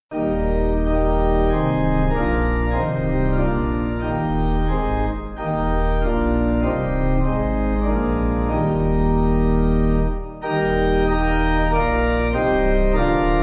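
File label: Pipe, hymn backing; Let Saints On Earth; Dundee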